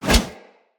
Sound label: Sword Whoosh; Weapons; Swords; Dendrit Sword And Board